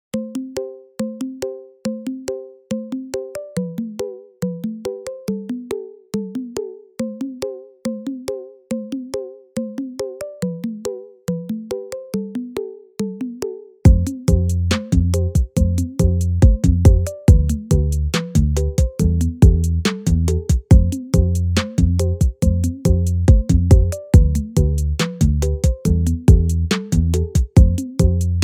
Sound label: Instrumental